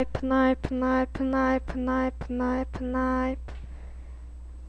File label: knife